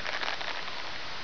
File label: rope; svencoop; items